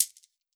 shek, WORLD PERCUSSION; Best Service ProSamples vol.52 - World Instruments 2; MIXED PERCUSSION

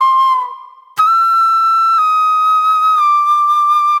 Soul Plate Flute

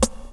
DR. LOOP; X-Static Goldmine 3

